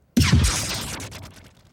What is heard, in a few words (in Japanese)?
SE 心臓麻痺; アニメ; デスノート